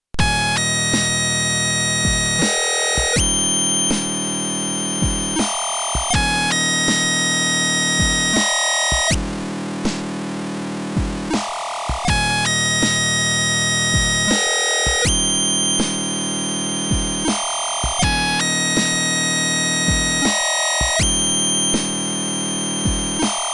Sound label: bagpipe